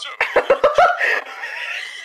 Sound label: wheeze